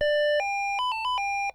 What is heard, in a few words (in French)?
sonnerie fixe; Sonnerie de téléphone fixe 1; SONNERIES, SONNETTES